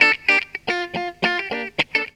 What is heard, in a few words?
GTR, Funky Elements GTR RIFFS; Zero-G